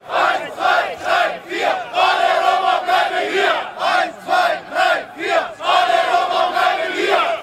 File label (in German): protestruf; roma